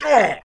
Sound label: pain; bruenor